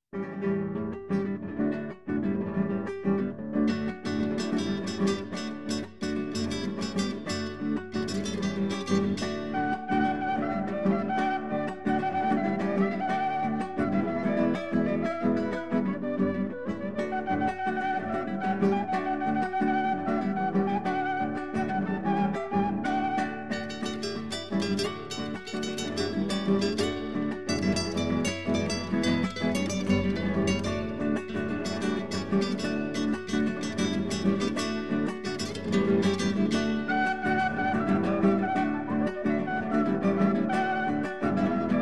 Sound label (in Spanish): la bruja, flauta guitarra y arpa de los andes